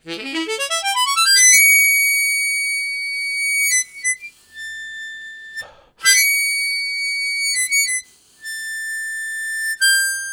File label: Harmonica, WAV Riffs; Zero G